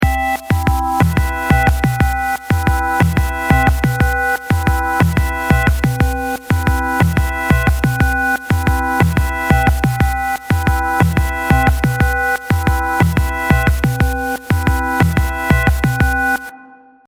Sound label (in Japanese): abstract, LFOを多用したリズム系サウンド; 魁Reaktor道場